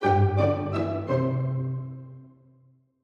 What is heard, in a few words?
Pizz Fast Instrumental; Royal London